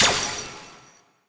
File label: Simple Gravity Switch FX G#Dim7; Video Game; Cymatics; Video Game Sample Pack Vol 1